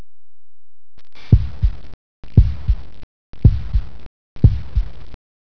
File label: heart beat